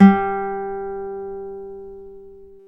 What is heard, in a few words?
GTR CLS GTR, Nylon String, Classical; Roland L-CD701